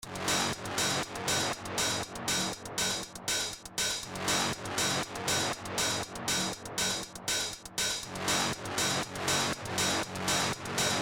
riddim 120bpm; burnout; weeklybeats-2020

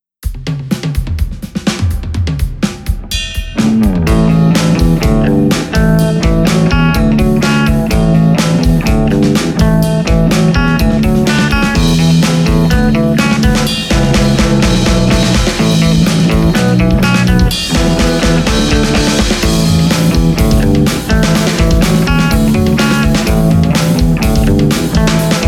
guitar; my guitar pick is a compact disc

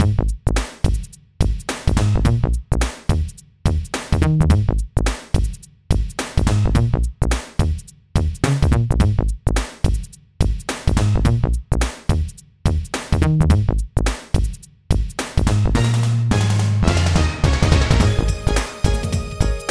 new jack swing; weeklybeats 2024

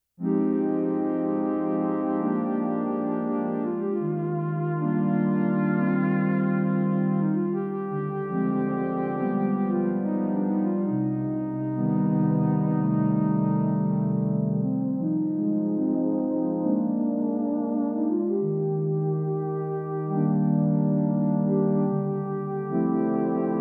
jazz; weeklybeats 2024; jazz for cyberpunk era

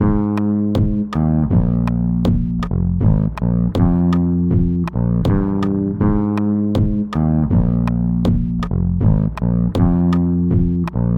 bass cover; soudain rien; shitty; weeklybeats 2026

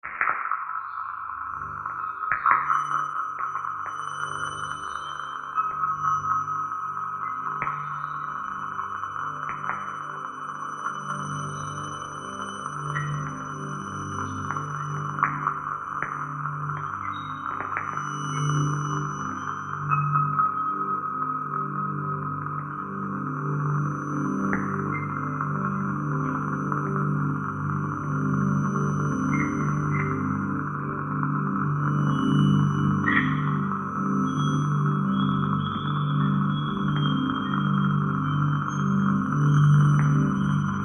curacao garden noise; weeklybeats-2016

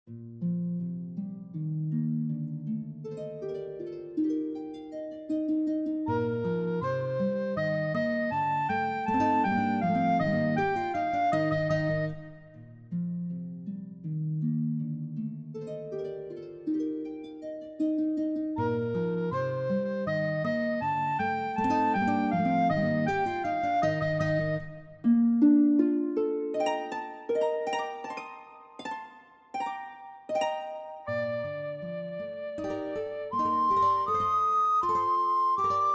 alto recorder guitar harp bransle brawl brattel

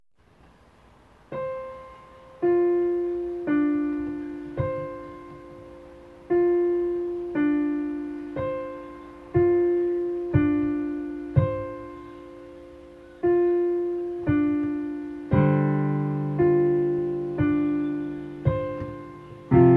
improv waves; improv 31

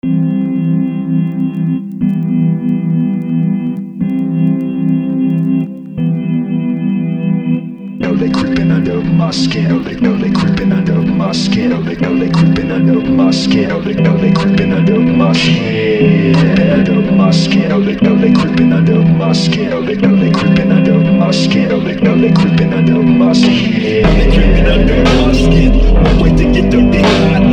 remix; weeklybeats-2014

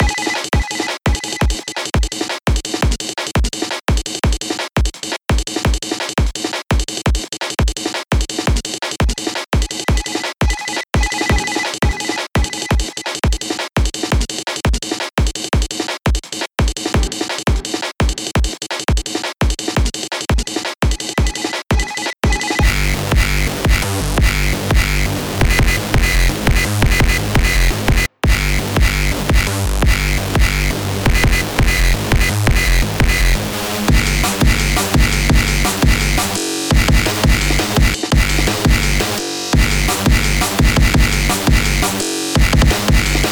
kick and punch

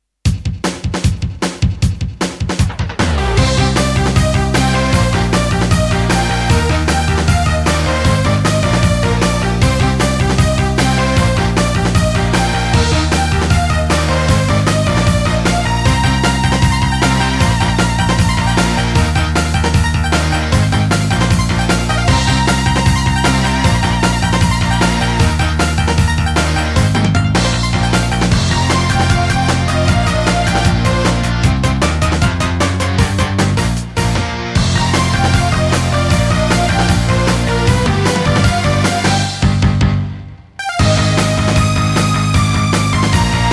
band session; weeklybeats-2016

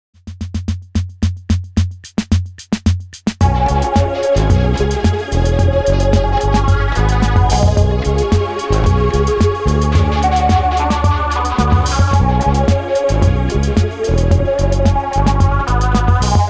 casio disco

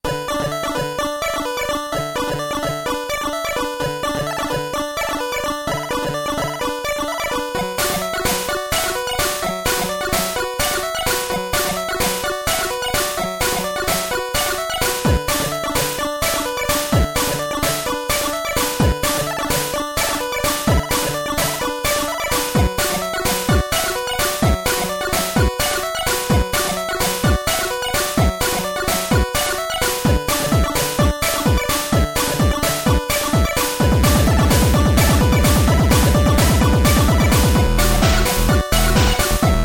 bass punch; weeklybeats-2016

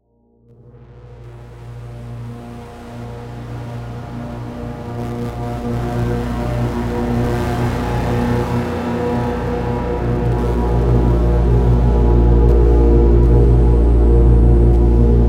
texture v noise drones and lfos

